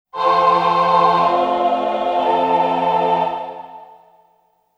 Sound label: Baton; Wind's Requiem